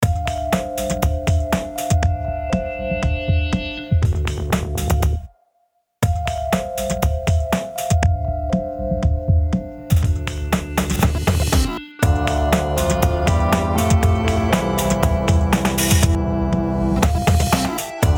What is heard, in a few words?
Upbeat Synthy